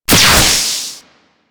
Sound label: Beam Fire; Super Moa Beam